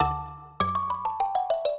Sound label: minuet, mbira; Mozart